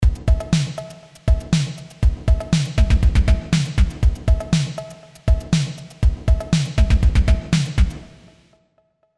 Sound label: CMI V Beat Rev LX 24 Dark Perc Chamber